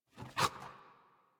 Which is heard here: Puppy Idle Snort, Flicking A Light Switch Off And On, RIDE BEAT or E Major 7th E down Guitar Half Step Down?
Puppy Idle Snort